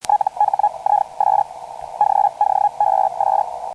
hell, digital modes

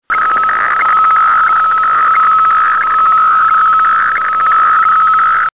fax, digital modes

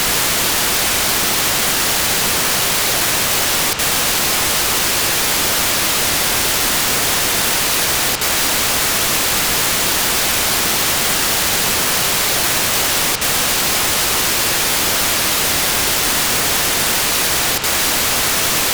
GASPACS AX25 AF

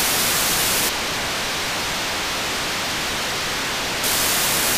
NOUR 2 best packet

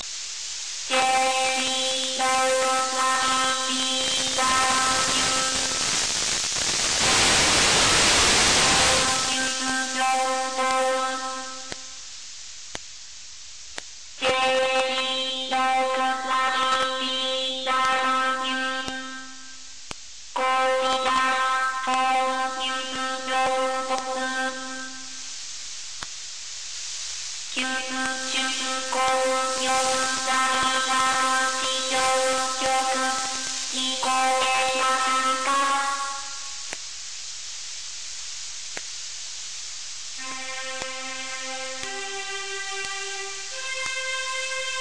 HORYU-4 Digi Singer

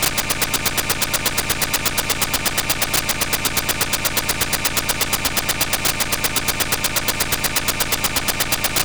AF; GASPACS; TTNC

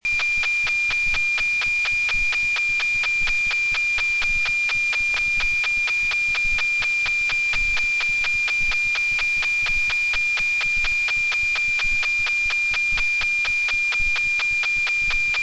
RS 40 Third Pattern

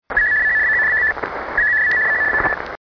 gmdss, digital modes

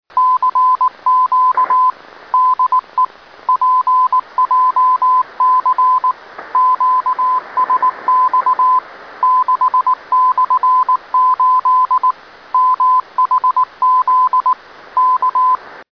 morse

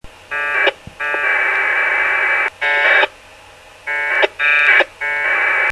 packet, digital modes

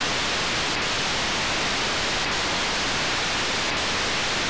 beacon; geoscan; rs20s

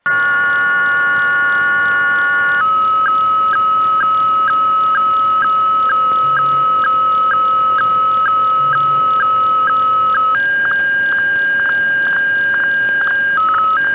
wefax, digital modes

